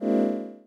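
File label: attack up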